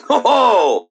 Grunt Attack; Lex; Paladins

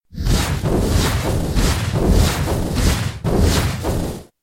Metamorphosis attack

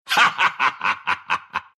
laugh, ru; dota2

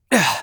Assassin Grunt Slow; Realm Royale; Shinobi

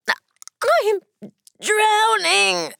Death; Paladins; Kinessa

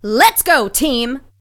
attack; Awesomenauts

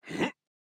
Grunt Jump; paladins; Knight

